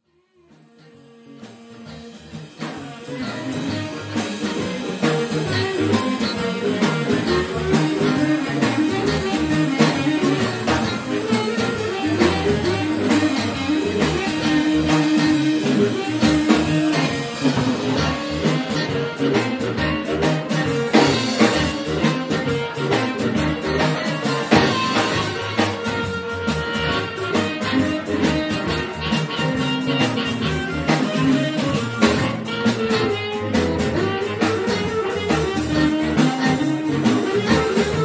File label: Blues Band Live